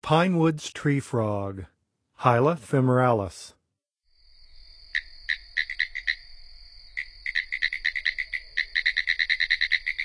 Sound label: Pine Woods Treefrog; N. America; Songs of Frogs & Toads of North America